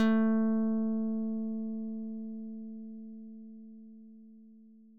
440Hz A4

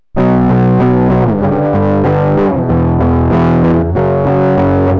dist 10k

